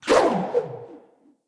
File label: Rope Winch Rope Launch